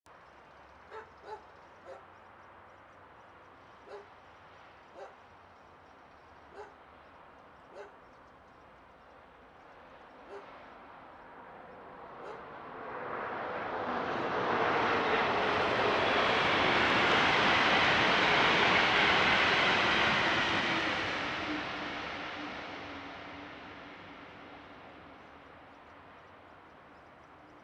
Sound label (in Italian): Treno mono; Eigenmike; A-weighted; Nuova Strada